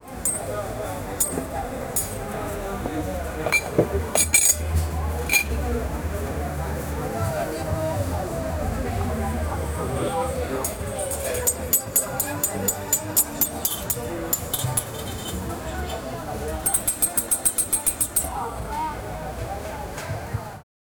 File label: bustling street food scen; ambient music